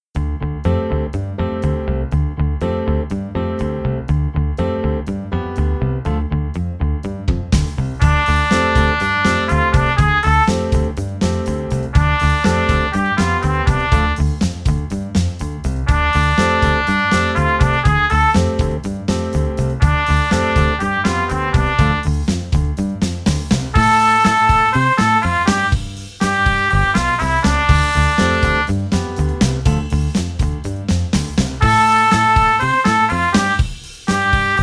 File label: trumpet; easy beat vol 1 trompete